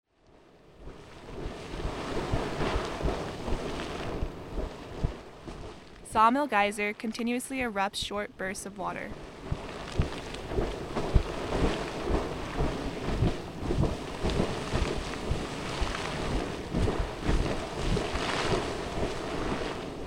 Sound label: sawmill geyser; sound and light ecology team